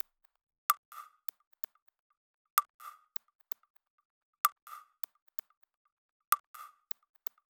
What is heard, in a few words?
128BPM Kit; Vengeance Samples; VFH1 128BPM Mini Space Kit